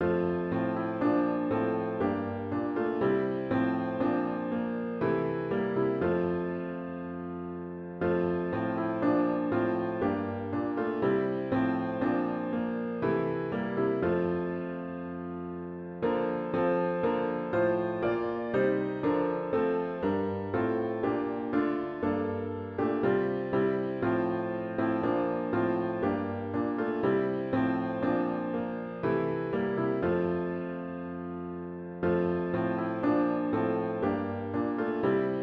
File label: piano only; Go My Children with My Blessing